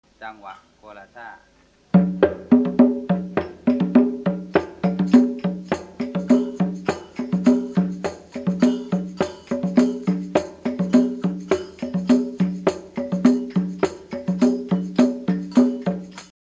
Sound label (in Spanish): guaracha